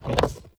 Fire Rain Ground Rupture; Powers; Fenn

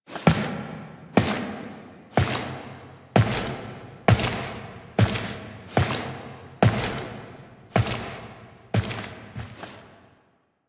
Foley; Tenno; Warframe; The New War; Fight Intro

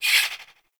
Swing, Melee; Balance Two; Warframe; Weapons; Modular Melee

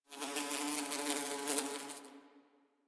Bee; Warframe; Ambience; Nineteen Ninety Nine

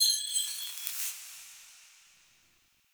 Mote Chatter Bell First; UI; Motes

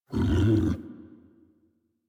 idle stand up, Kubrow; Enemies; Warframe; Tame

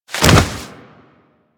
Generic Roll, Foley; Characters; Warframe

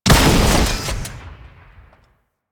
Flak Cannon Fire; Warframe; UT Flak Cannon

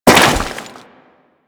Step Dirt, Footsteps; Warframe; Corpus; Crewman

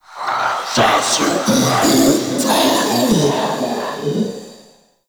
Male Vocal Charge Scream; Characters; Enemy; Vocals; Mockeries

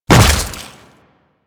Step Goo, Footsteps; Enemies; Warframe; Grineer

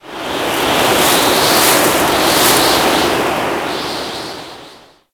Ocean Rain Gust; Warframe; Grineer Ocean

